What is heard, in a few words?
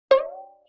Violin Pluck Pitch Rise